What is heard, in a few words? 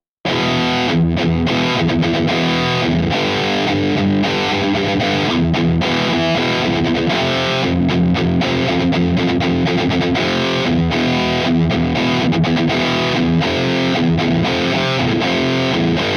emg81 od9 triple wreck mesa rectos sm57 tube; overdriven